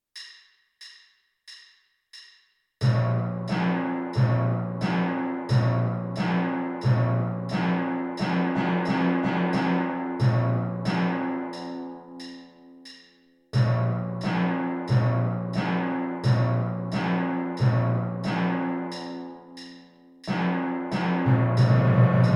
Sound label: timpani part b